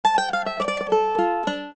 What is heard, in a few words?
mandolin